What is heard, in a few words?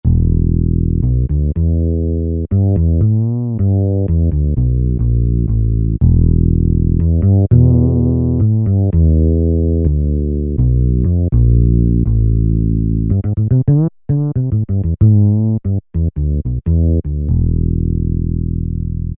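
Bass Fretless, Yamaha EX5R; Factory presets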